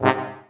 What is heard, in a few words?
Trombone Blip C; Hootowl; pitchglitch